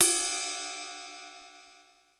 RIDE CYMB; East West; Global Sounds; R8M

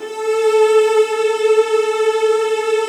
A2, Virtuoso Strings

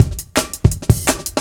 Live Wire Cut, Drum Loops, 168.5, Jungle; Zero-G Jungle Warfare 1, 2 & 3

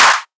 drum clap gritty dirt; Virtual Riot; Drum Hits

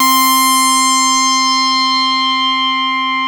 MC 202; Masterbits; Vintage Voltage

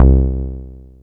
SOFT C2; Best Service Alpha Dance vol.2; SOFT SEQ.2

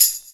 tambourine, House Oneshots; E Lab; XTc Files of House; Shakers and Tambourines

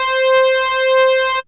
SWEEP C4; Best Service Alpha Dance vol.2

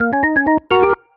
ORGN 123, Keys; Houseworx

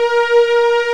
STRING; VOY8 STRING2; ESOTERICANLG

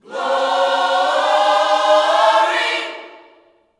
GLORY, Vocal Planet Gospel

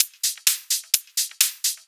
Hihat Loop 128 BPM

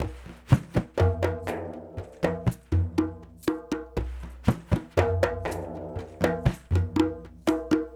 Conga Swish, Drums; Sonic Foundry (Sony Creative Software); Friendly Fire; Marc Steve

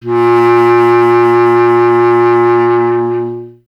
B. Clarinet

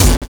kick, Drum and Bass; EZ Rollers; Instrument Samples

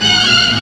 Stab, FX; Zero-G Jungle Warfare

